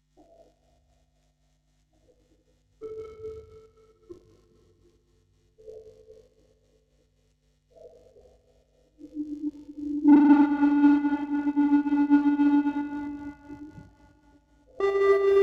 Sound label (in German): Aufnahme Vocoder, Spieluhren-Quartett